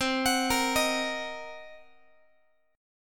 C Minor 7th Flat 5th Guitar, chord; Cm7b5 arpeggiated; JGuitar

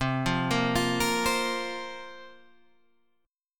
C 7th Suspended 4th, Guitar Drop D, chord

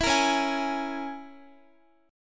Db Minor 6th Db Guitar; strummed; x,x,14,15,13,15; 4E,4A,5D,5G,5C,6F; Dbm6

